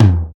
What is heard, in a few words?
Good Tom Drum Single Hit F Key, Royality free tom drum one shot tuned to the F note; tom drum samples; one shots